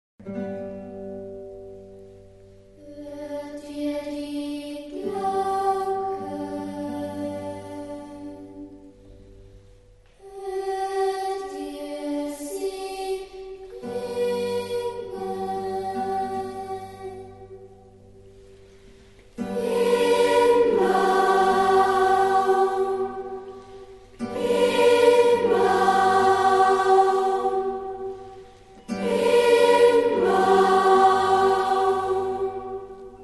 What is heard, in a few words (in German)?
hochzeitsglocken; olympiachor